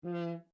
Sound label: HORN E4 FF SHORT STOPPED, French Horn in F; Alexander French Horn in F; Musical